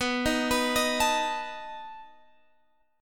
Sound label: B 7th B down Guitar Open G